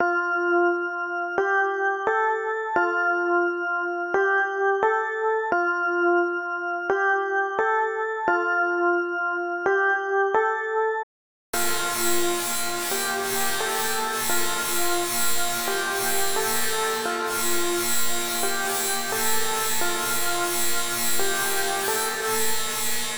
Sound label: fx reverb; plugins